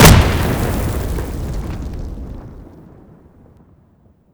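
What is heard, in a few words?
grenade explosion close; Escape from Tarkov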